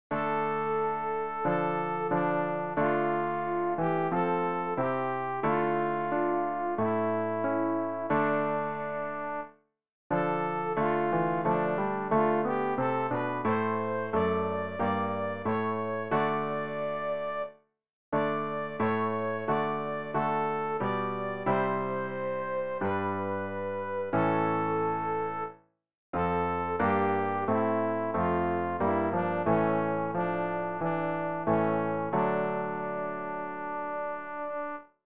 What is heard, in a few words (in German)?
sopran; Ref. Gesangbuch; RG 590: Hinunter ist der Sonne Schein